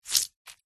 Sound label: horror gore fresh fleshy guts bloody squeeze gouging squelch